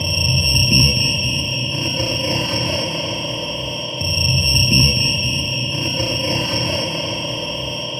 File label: Dark Beat, Dark Organic Processing; Sonic Foundry (Sony Creative Software); Loop Noir